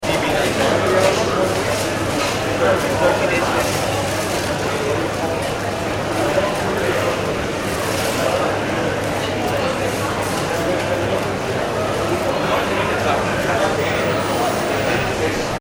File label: ambience airport heathrow departure lounge; London Heathrow Airport; Airports; McKinneySound